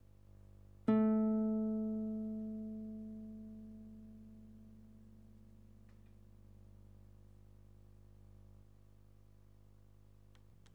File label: guitar